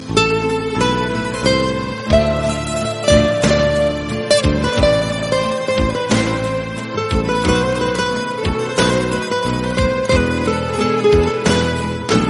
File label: turkish guitar, ringtone